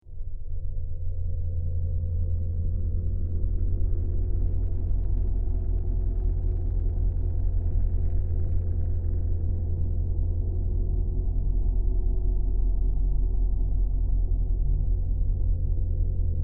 Drone SciFi Spacecraft Interior Tonal Rumble Atmosphere; Sound Design